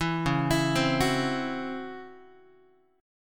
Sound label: Db Minor Major 7th Double Flat 5th Db down Guitar; arpeggiated; DbmM7bb5; JGuitar